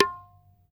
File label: TABLA HLF OPN, Indian perc; Spectrasonics - Heart of Asia CD2